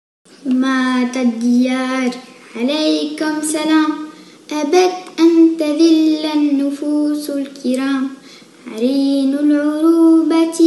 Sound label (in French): Hymne national syrien